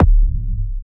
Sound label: Kick